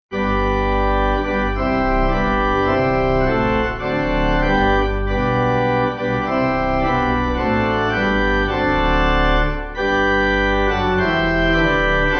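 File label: Pipe Organ; Jesus I Am Resting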